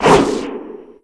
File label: woosh; Umineko SFX